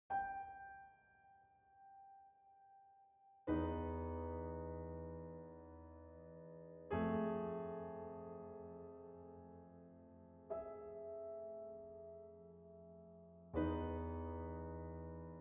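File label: piano piece, organist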